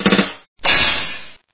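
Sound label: drum solo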